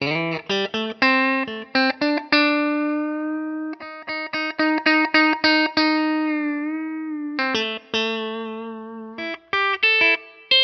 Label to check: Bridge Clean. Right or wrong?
Right